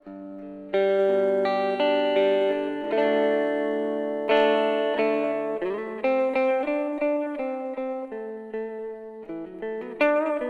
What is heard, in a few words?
clean reverb; fluid audio strum buddy test